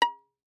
Violin pizz ff sul E Db6; MIS Pitches - 2014; Strings

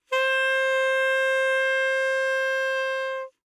Alto Sax No Vib ff C5, Eb Alto Saxophone; Woodwinds; MIS Pitches - 2014

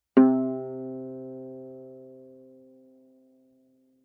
Viola pizz ff sul C C3; MIS Pitches - 2014; Strings

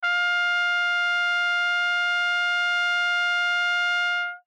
Trumpet novib ff F5 stereo, Bb Trumpet; MIS Pitches - 2014; Brass

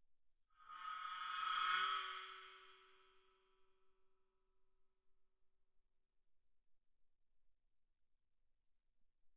splash, bow, suspended cymbals bowed; Percussion

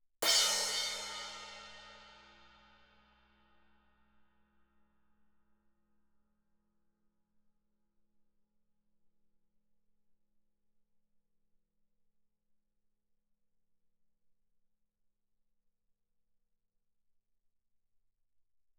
crash stick shoulder ff, suspended cymbals; Percussion

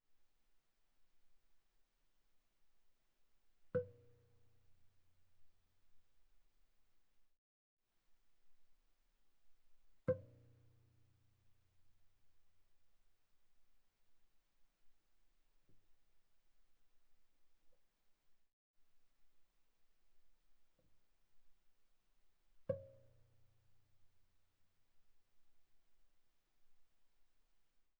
Viola pizz sul D pp C6 D6 stereo

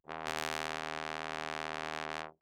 Bass Trombone ff Eb2; MIS Pitches - 2014; Brass

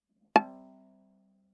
Viola pizz ff sul D A5; MIS Pitches - 2014; Strings

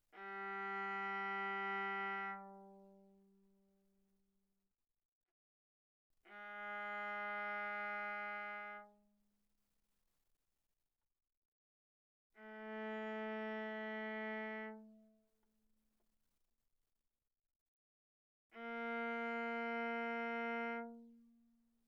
Viola arco sul G mf G3 B3

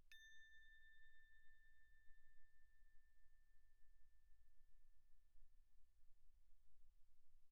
crotale A6 pp; Percussion; crotales

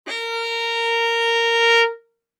Violin arco ff sul A Bb4; MIS Pitches - 2014; Strings

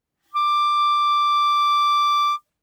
Bb Clarinet ff D6 stereo; Woodwinds

